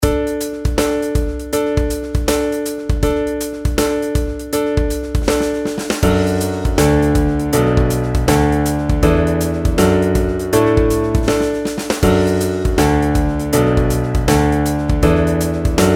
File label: pitch patterns skipwise